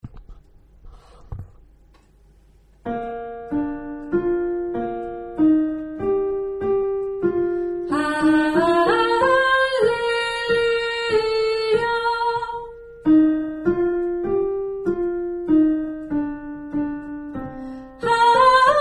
livet vann sopran

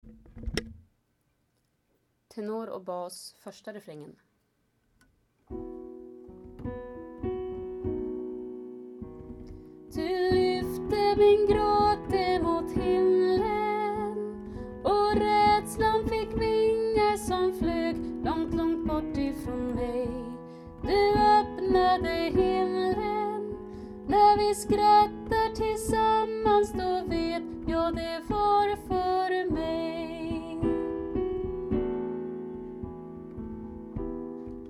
du lyfte min grat tenor

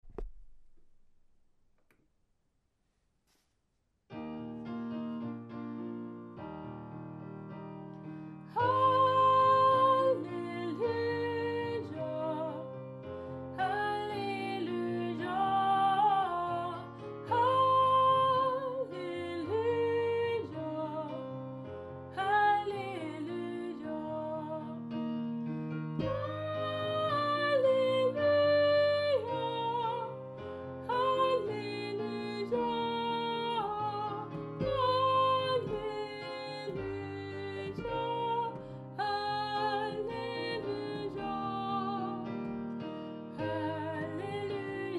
dina hander ar fulla av blommor refr tenor

